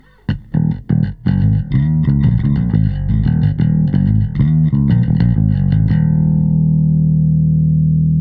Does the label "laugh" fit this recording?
No